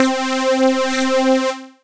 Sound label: Brass, Operator; Fancy Brass